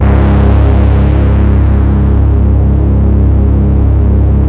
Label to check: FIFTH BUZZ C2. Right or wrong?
Right